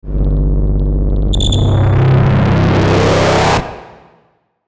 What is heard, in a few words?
Feedback Buzz Ascend, Crystal Feedback Swell Front To Rear Crossing; Feedback Sound Effects